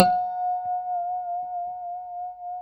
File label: G5 pick harmonic, Guitars; Spectrasonics; Hans Zimmer Guitars VOL-1; JC-HARMONICS